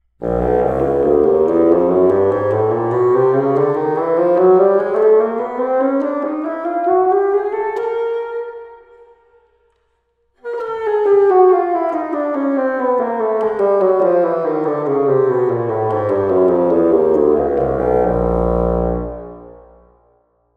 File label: Bassoon technical chromatic